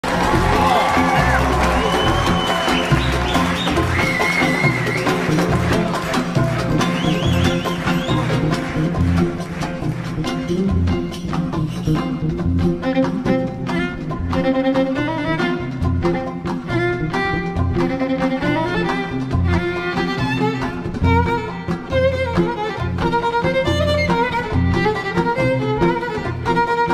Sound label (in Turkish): harika keman resitali violin